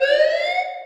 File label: MCOM Alarm; Battlefield 4